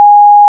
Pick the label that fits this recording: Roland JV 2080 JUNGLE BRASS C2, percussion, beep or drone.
beep